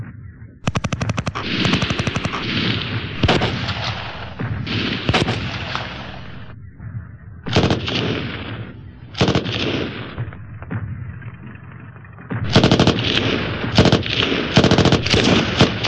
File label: War close